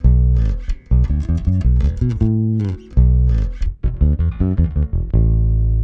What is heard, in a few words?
Bass Hip-Hop